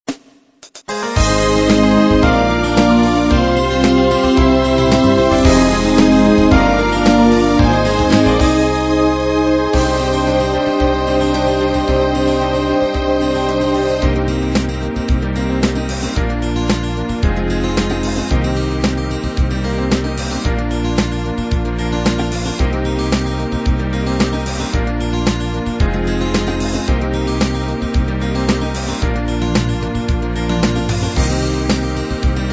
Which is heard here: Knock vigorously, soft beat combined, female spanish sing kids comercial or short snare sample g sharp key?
soft beat combined